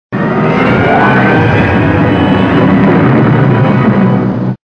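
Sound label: hyperspace Jump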